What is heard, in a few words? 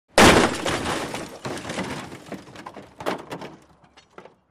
Vehicle Dropped On A